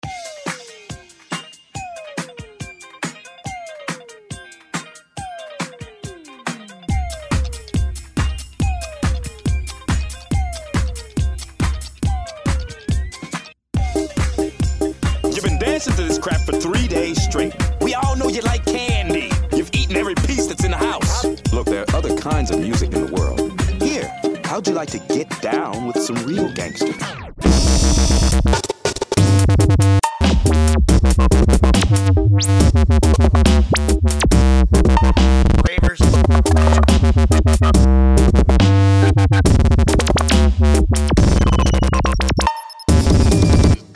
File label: train wreckstep